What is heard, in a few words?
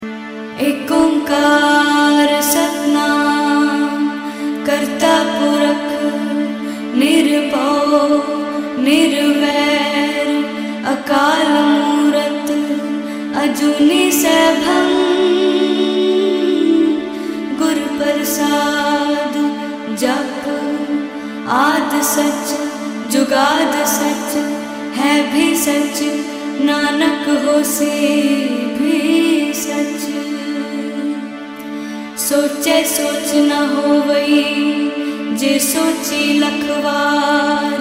Female Version Ringtone; Ik Onkar